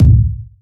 boomy kick drum A key, kick sound tuned to the A note, Loudest frequency: 138Hz; kick drum samples